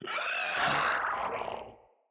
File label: Infested Runner Explosion Warning